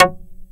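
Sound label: TURBO ACID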